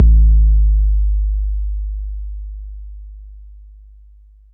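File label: bass drum 808 Smooth C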